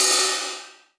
Cymbals Ride; Vengeance Samples

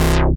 Bass C, Offbeat Bass

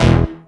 Synth Bass; Vengeance Samples; VEE Synth Shots

